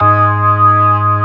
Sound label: Organ B3 Hammond, G#2; Best Service ProSamples vol.55; Retro Sampler